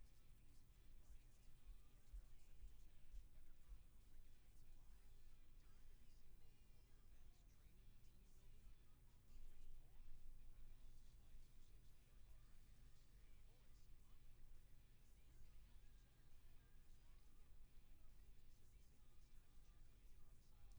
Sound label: room tone